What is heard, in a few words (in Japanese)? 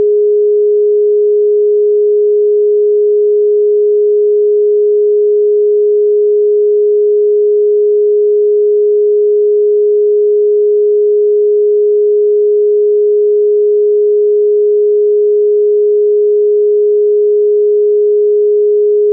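417 Hz, ソルフェジオ周波数 信号音; 第2チャクラ 橙; マイナスな状況からの回復、変容の促進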